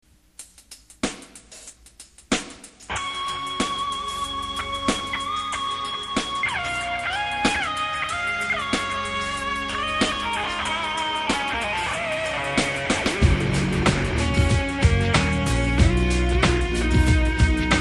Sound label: Instrumental